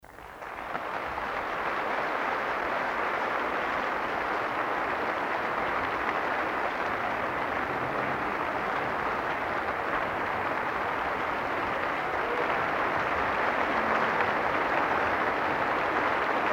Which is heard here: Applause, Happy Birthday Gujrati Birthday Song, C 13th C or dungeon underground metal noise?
Applause